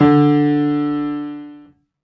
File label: Piano, Harmonic